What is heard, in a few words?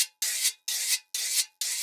Guira 130